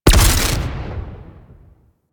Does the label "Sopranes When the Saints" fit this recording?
No